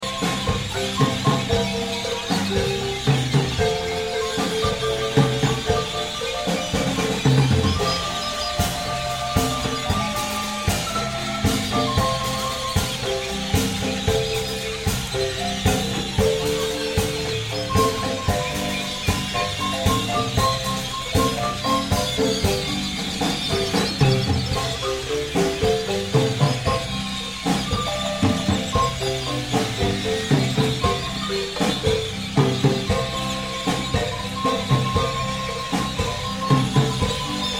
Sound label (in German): Marimba Palenque Voegel; Mexico; Usumacinta; land under usumacinta ueber allen ufern